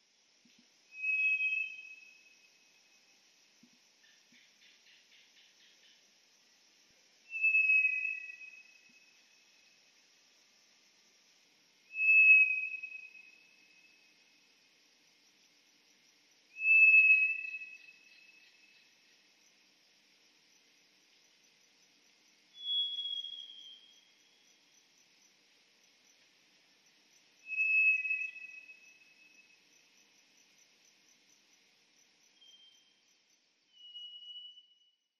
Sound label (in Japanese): toratsugumi, hahajima